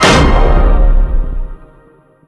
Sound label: Crash Over; Burnout Crash